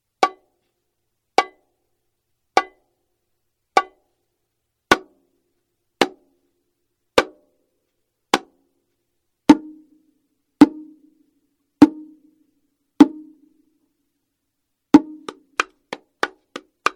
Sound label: bongo; MATADOR; NUSKYN